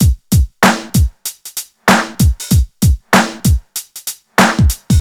96 bpm drum loop sample c sharp key, kick tuned to the C# note